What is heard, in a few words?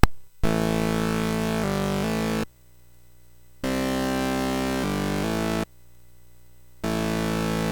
MOS6581R3, GAMES; Slinky